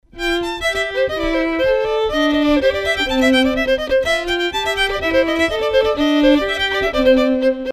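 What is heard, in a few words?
violin playing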